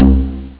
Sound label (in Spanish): Gato